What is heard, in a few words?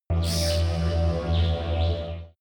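extrinsic chirp, energy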